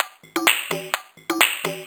FX Loop 128 BPM, House

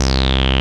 BASS, Analogon; A-BASS 2

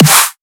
snare crashed

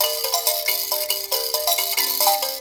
VIB KALIMP, VIB KALIM

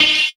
Wah Kick; Sonic Foundry (Sony Creative Software); Esoterik Beatz; Wah Kit

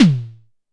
Drum X Tom; Pearl Drum-X

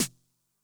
Snare Drum, Low Tune Low Tone, 909; classic machines; 909mod

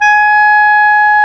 WND CLAR2, Clar Jazz

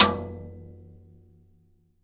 timbales; AKAI S-Series CD-ROM Sound Library VOL-5

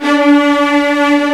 VIOLINS A; AKAI S-Series CD-ROM Sound Library VOL-2; ORCH

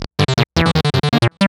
Arp, Keyboards

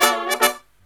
Brass Riffs; USB Soundscan vol.29; AKAI

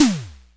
TOM, Simmons SD-8 Drums

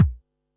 Bassdrum, Pearl SC-40

